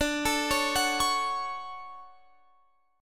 D Major 7th, Guitar, Open F; DM7 arpeggiated

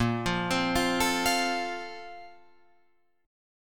A sharp Major 7th Suspended 2nd, Guitar, Open F; A#M7sus2; arpeggiated; JGuitar

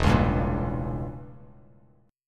E Diminished 7th E, Bass Standard; Edim7 strummed; JGuitar

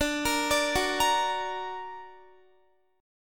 D Augmented D down Guitar; D+ arpeggiated; tuning 4E,4A,5D,5G,5C,6F

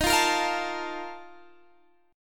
Bb Major 7th Suspended 4th, Eb, chord

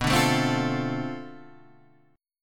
B Minor 7th Flat 9th Flat 5th B, guitar chord; Bm7-9-5 Chord {7 5 7 5 6 5} strummed

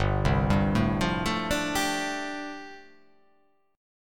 G 9th Suspended 4th

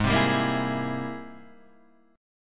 G sharp Augmented Major 9th G sharp; G#+M9 strummed; x,x,6,5,7,5,6,5; JGuitar

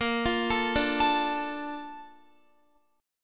A sharp Major 7th, Guitar; arpeggiated

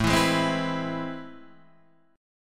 A Minor Major 9th, down, Guitar, Half Step Down

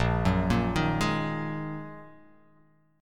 A sharp 7th Suspended 4th Sharp 5th, chord

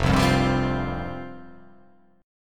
F Suspended 2nd F

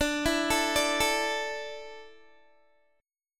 D Suspended 2nd D down Guitar; Dsus2; arpeggiated

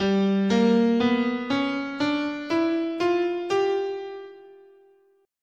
G Hungarian Major, scale

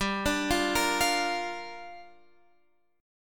A sharp 6th G

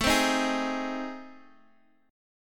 A Minor Major 7th Flat 5th, Guitar DADGAD

